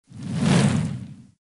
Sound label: Lava Sound